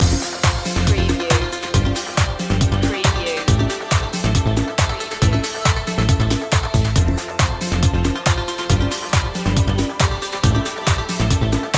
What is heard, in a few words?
dance nation loop